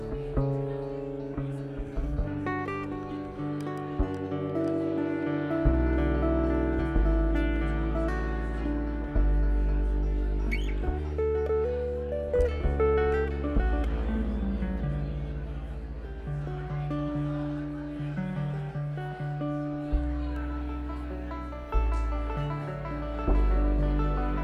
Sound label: tuning